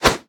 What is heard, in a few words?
lobby hifive swish; smite; vamana